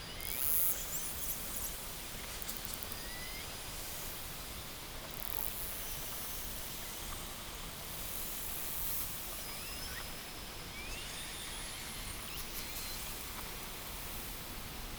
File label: Sound click